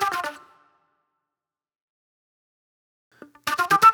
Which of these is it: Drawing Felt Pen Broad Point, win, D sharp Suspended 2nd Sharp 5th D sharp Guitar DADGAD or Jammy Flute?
Jammy Flute